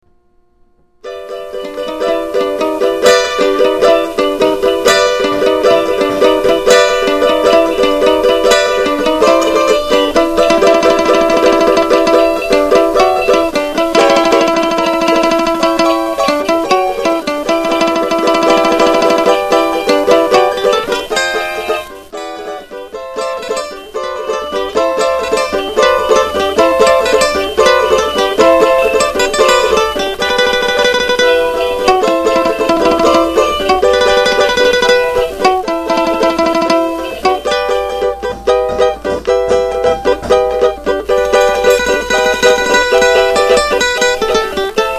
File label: Chorinho